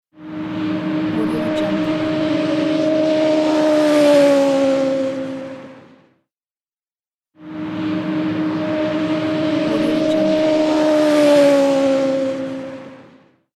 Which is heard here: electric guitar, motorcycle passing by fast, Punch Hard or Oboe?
motorcycle passing by fast